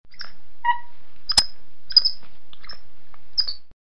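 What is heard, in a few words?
sounds of monkeys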